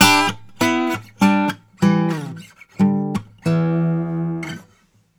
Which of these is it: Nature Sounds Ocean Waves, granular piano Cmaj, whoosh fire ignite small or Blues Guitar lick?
Blues Guitar lick